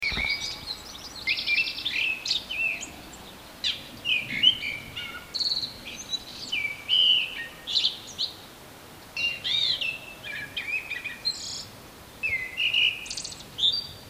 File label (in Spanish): Mirlo común Turdus merula merula